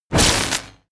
flash, Zaps und Buzzes

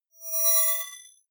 hologram appear, energie

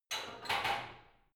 barbell heavy plates replace rack reverby soft; sport freizeit; fitnessstudio

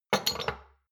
shot glasses place multiple soft; soundpool

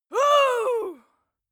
female cheer

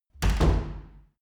wood door old hard

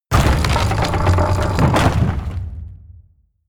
mechanical lever wood large up; industrie-wirtschaft; mechanik

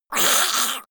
monster choking high; koerpergeraeusche; comedy-cartoon; soundpool

